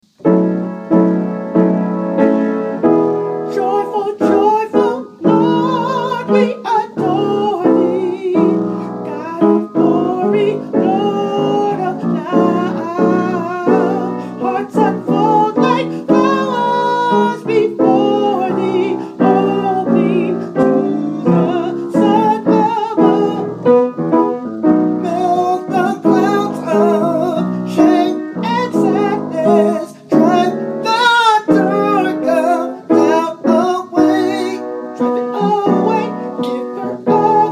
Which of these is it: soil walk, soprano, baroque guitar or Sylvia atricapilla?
soprano